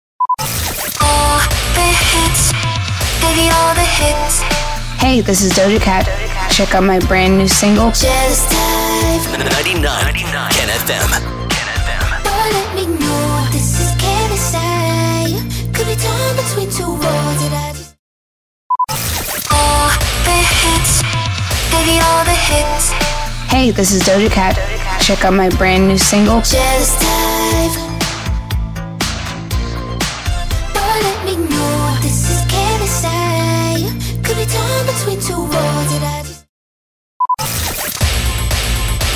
SWEEPER POWER INTRO 120BPM

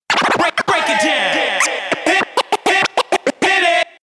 BREAK IT DOWN SCRATCH 100BPM

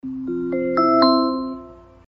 SFX AMAZON ECHO INCOMING CALL

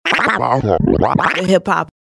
HIP HOP SCRATCH

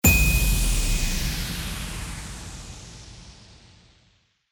IMPACT CHRISTMAS

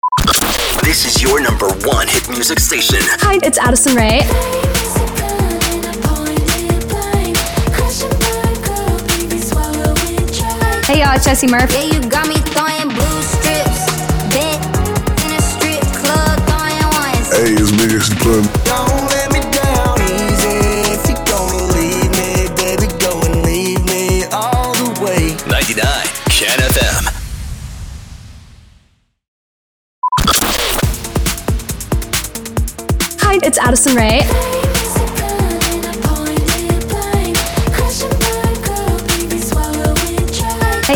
sweeper beat mix

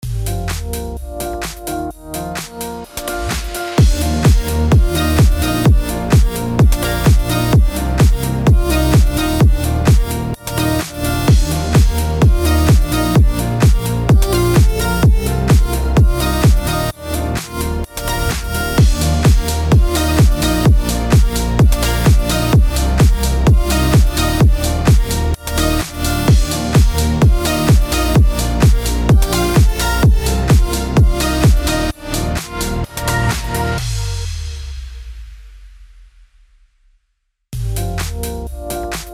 BED ELECTRO HOUSE D#MIN 128BPM

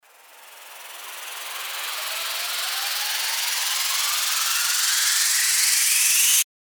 RISER

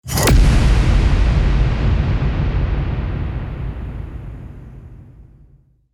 IMPACT

